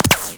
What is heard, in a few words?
Shield Impact; Warframe; Tenno